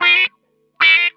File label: WAH, Funk Guitar; Zero G - Funk Guitar